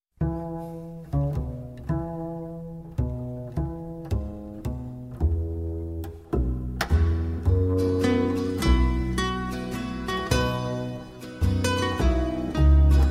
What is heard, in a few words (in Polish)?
bas gitara